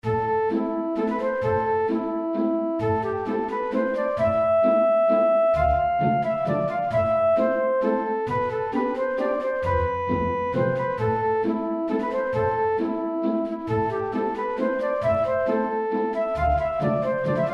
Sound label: Mazurka; la gueussinette